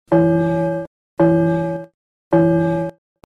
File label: Gong Sms Sound